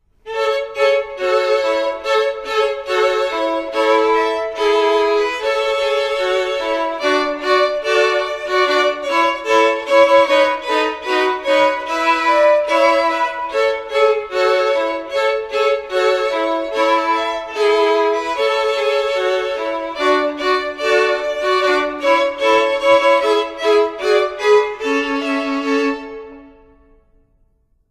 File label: Jingle Bells Violin; Community School of the Arts